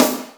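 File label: Power S, Boss DR660 snare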